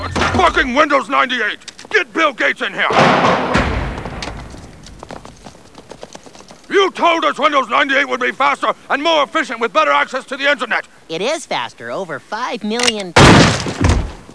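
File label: metal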